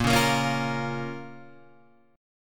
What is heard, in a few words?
Bb Minor, Guitar, Open G